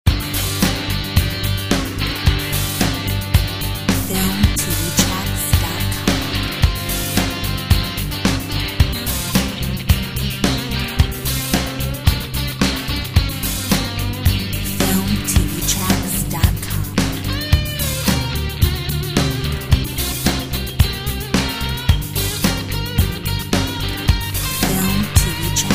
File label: Blues in action